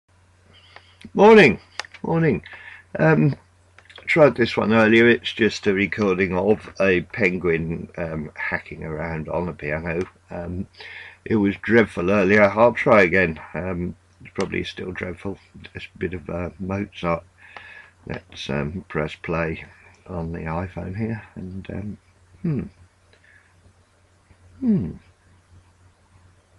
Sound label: piano